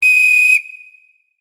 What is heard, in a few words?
race start; talking tom gold run gamerip 2016